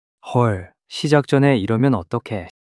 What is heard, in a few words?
surprise